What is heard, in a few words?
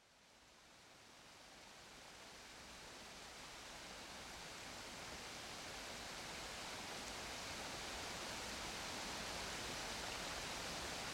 Subtropical Himalayan Glacier Stream Himachal Pradesh; Kulani; Mustard Lake; Wind Is the Original Radio